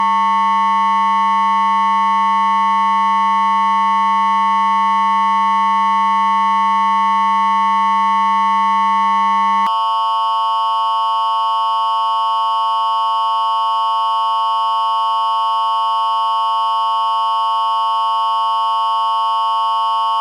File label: 900+1100 intermod